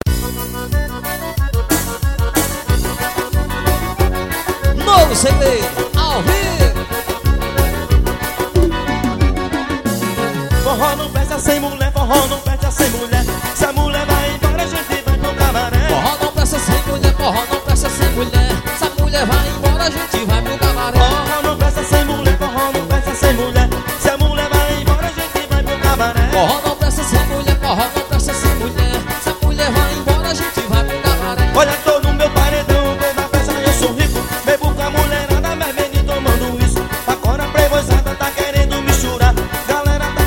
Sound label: jingle bells medley